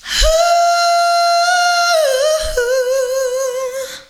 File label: ad lib hooo, female vocals; PlatinumPhattCD2; E-MU Producer Series Vol. 8 – Platinum Phatt